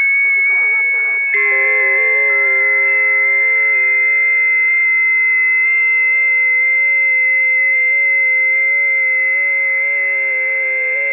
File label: 20m busy, ft8